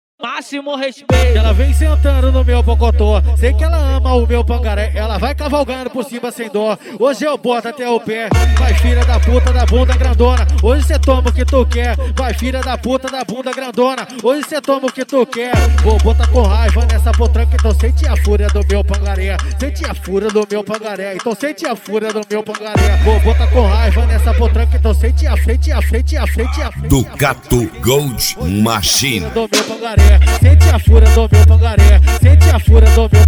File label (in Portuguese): especial funk